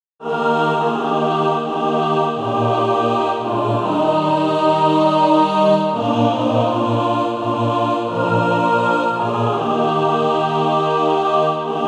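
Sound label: mixed, SATB Practise Music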